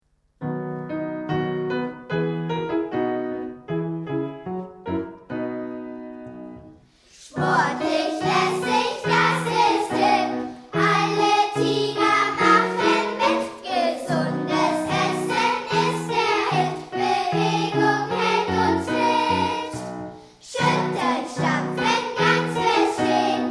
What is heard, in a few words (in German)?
Tiger Song; TigerKids